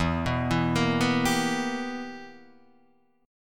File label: B Major 7th Suspended 4th Sharp 5th E